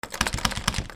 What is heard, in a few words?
DOORS